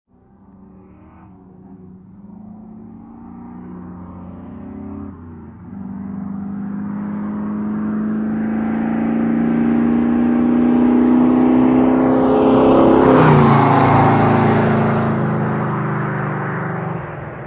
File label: Muscle Car Fast By 100 MPH